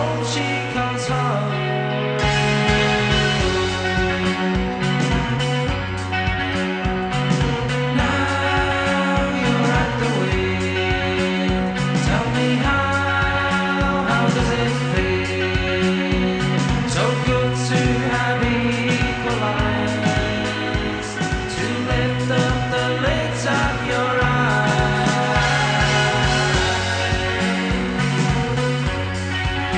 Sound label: rock